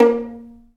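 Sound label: Vio pizzicato Bb2; Digital Sound Factory Virtuoso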